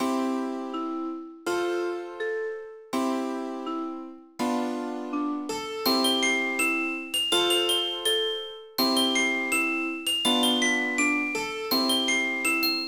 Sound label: Music Box